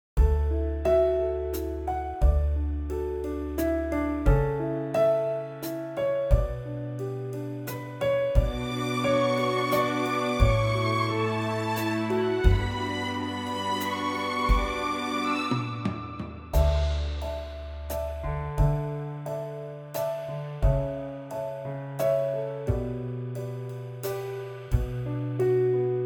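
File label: D, backing track; key - D - vocal range - F# to B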